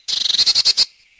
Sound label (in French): mesange bleue